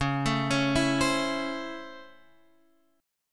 C sharp Minor Major 7th Sharp 5th C sharp Guitar Open F; C#mM7#5 arpeggiated; JGuitar